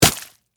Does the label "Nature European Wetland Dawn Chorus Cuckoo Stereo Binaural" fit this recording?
No